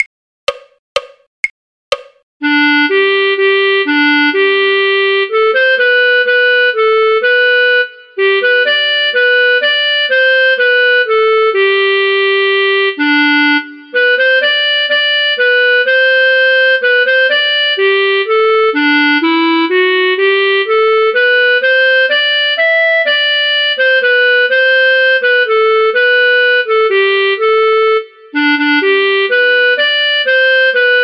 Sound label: clarinet; sweet nightingale; folk